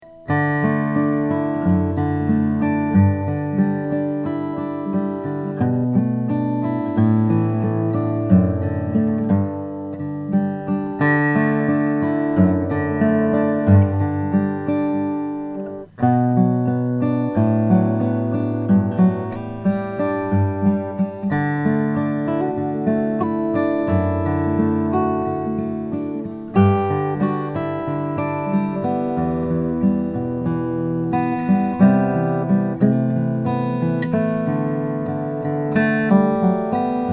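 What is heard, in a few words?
lowden f35 golden figured maple, guitar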